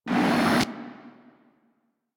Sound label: Fire Blast Build Up; Warframe; Sargas Ruk